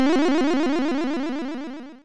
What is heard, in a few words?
synth, amiga med